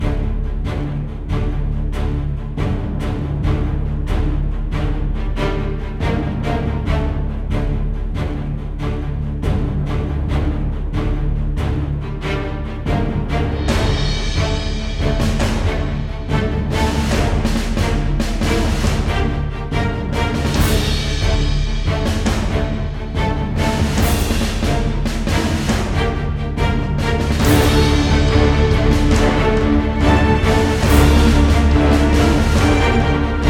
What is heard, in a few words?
Wind Of Battle Looped; Bonus